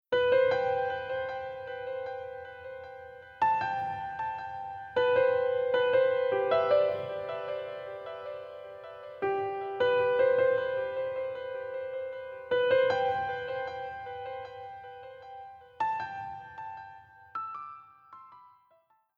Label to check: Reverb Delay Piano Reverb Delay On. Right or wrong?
Right